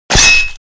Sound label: weapons sword hit flesh bone